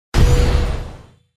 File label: Magic Hit; Магический удар; Звуки удара; Звуки удара для Форсакен